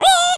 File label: skelly small